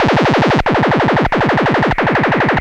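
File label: monotron delay, synth loops; instruments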